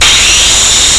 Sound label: Saw Starting; After Dark 2.0 Modules